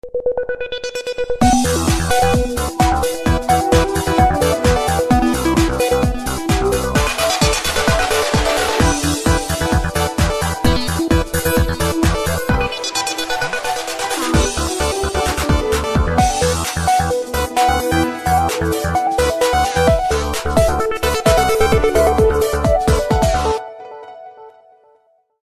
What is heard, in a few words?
Metal element; LG UX300